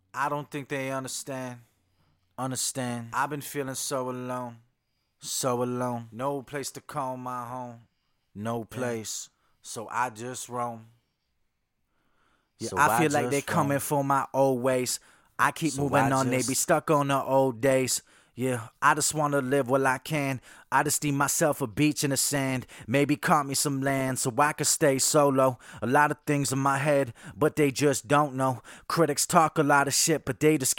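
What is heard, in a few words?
hip hop 82 Dm, acapella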